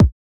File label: Kick, techno drum